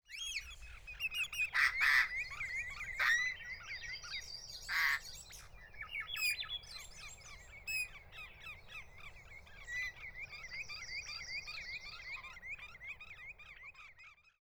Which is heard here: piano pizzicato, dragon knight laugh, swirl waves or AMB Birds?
AMB Birds